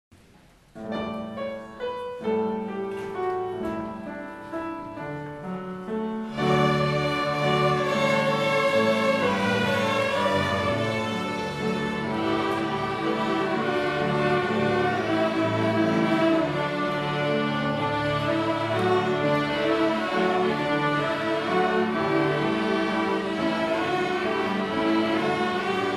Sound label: French Folk Song, Orchestra; Spring Concert 2013